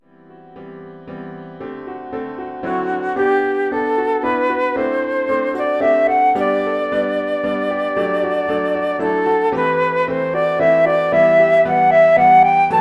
WALTZ flute solo

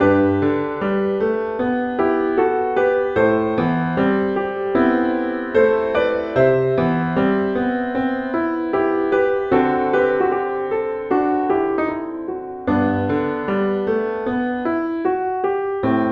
advanced piano solo

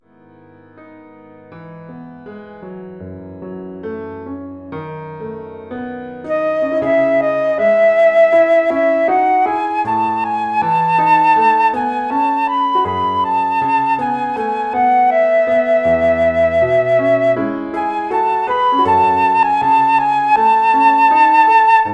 flute solo + PA

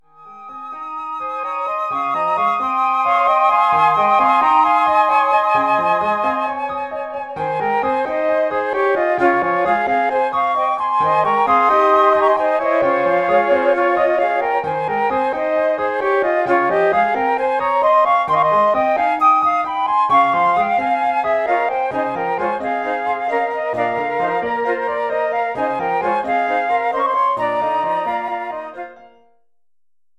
flute quartet + PA

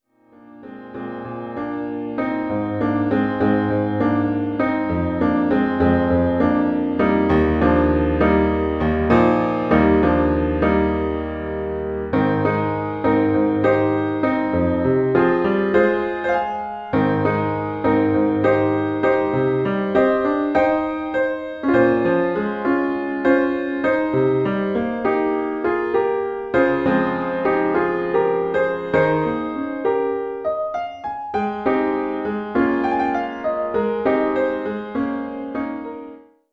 intermediate piano solo

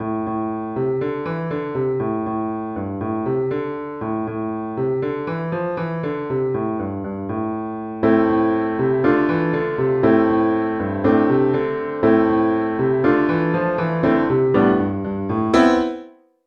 elementary piano solo

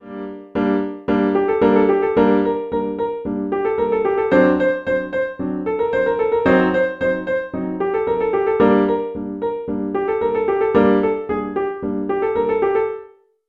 elementary piano solo

